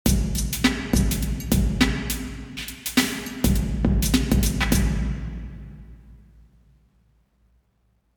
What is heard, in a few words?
drum, cathedral; Lady Chapel St Albans